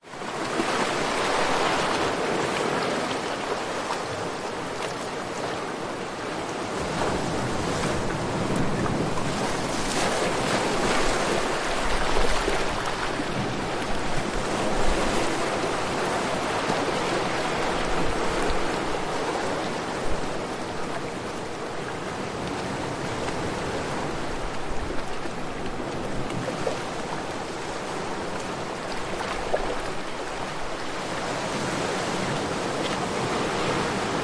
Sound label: nature ocean waves on rocks water flow surge around rocks middle tide; zapsplat